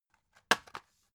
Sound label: drug package drop deep hard; berufe; arzt